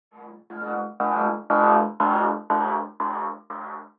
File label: Rhythmic (120BPM); musicradar